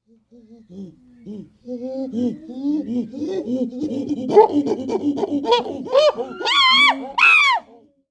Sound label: Jungle Primates; gnarled forest